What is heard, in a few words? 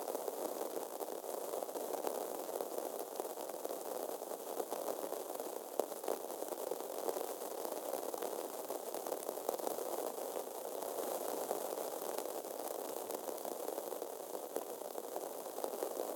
rain on helmet; STALKER; IWP